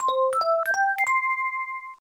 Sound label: Race Starting